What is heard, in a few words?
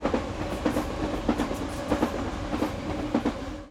train